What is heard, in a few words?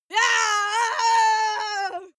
female lunatic scream attack long